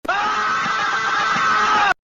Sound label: screaming; tom sings